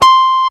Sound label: Casio CTK-611 Guitar Harmonic C6